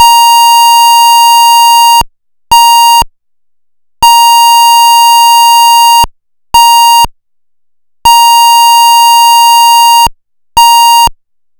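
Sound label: Interrupted IMO telephone, IMO (telephone call); Interrupted tone; pfannenberg; signaling-technology; combined-signaling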